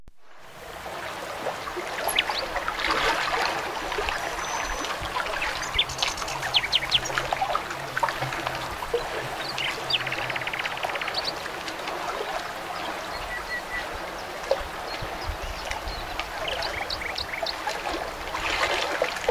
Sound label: Lake Sound Effect; Circle Empires Rivals